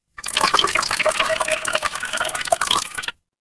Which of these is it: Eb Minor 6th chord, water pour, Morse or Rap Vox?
water pour